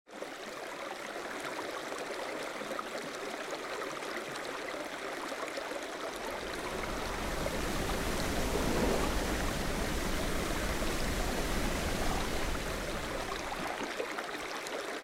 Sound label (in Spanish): Aguas